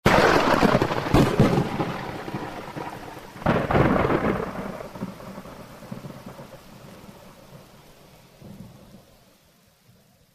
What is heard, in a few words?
Thunder Strikes Lightning Storm Claps